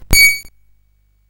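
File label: Moon Patrol, MOS 8580 SID chip music, games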